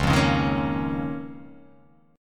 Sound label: C sharp 6th Add 9th C sharp down